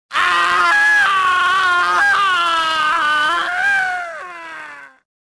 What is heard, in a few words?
falling; jedi